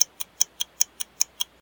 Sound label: ticking; 摇钱树